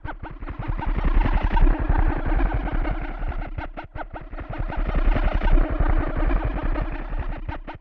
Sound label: Scanning Probe